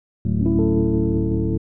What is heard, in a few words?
house synth 125 A, keys